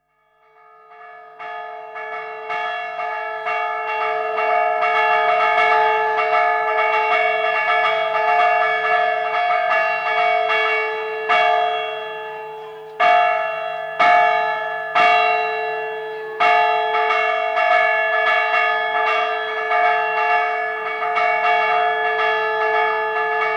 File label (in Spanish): toque a fuego, campanas